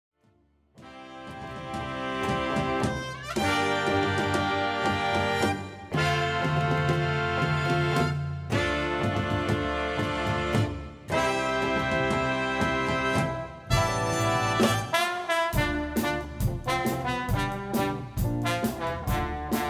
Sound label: Big Band